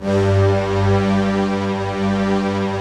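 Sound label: Chinese orchestra, Filter; Optical Media International; Sonic Images Library; Chino Filter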